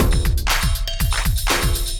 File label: Kindified Kit, Drums; Kindified Kits